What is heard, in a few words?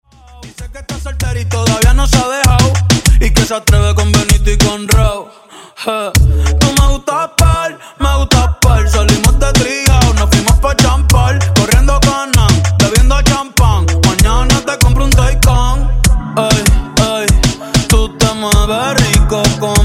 Party Transition 97 130 Bpm